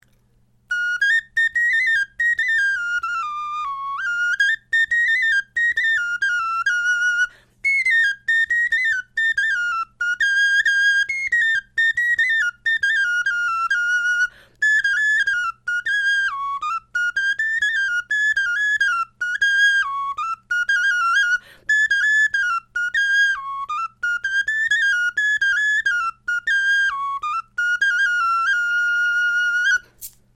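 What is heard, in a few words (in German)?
melodie, Knochenflöten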